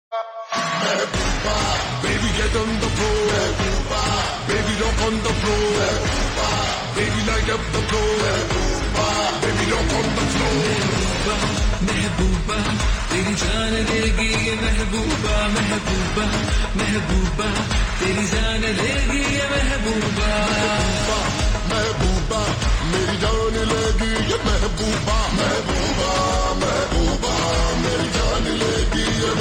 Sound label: Ringtone Slowed Reverb